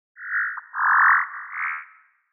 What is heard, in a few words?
jungle, jungle ambiance